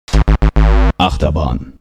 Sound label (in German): Achterbahn; Kermis Jingles Diversen